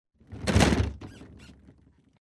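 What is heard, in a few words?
movements inside the house; Звук землетрясения в доме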